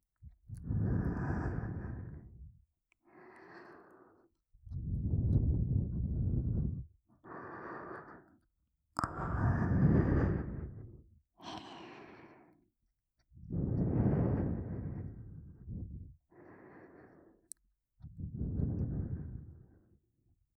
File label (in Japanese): 吐息